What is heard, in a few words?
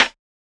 snare; dough-samples; eddyflux